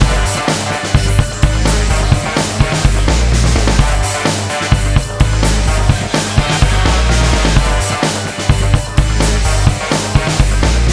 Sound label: Mix No Kick Ducker